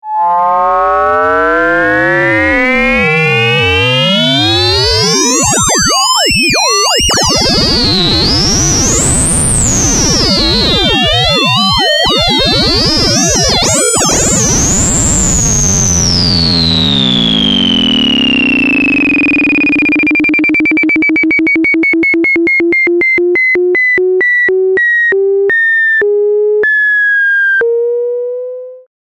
oscillator; tellun

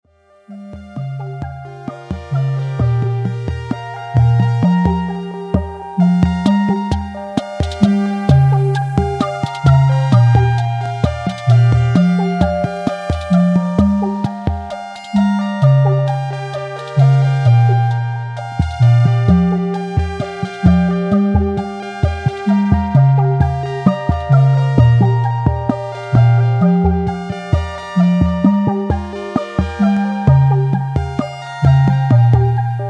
Synth noodle; AudVid

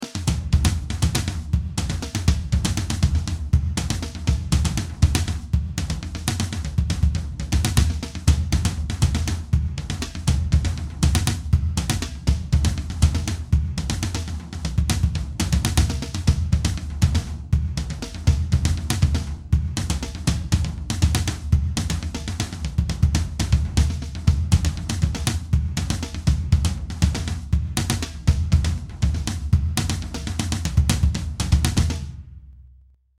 drum test

